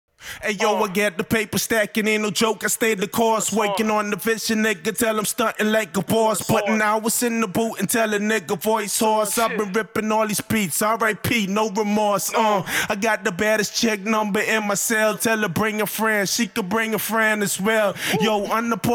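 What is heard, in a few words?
hip hop, 164, A major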